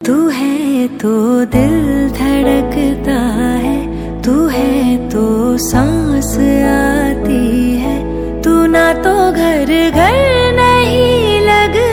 female hindi ringtone

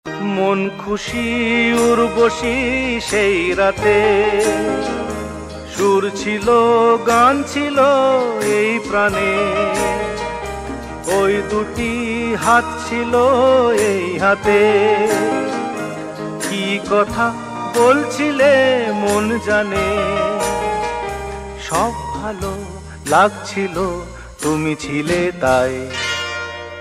ringtone

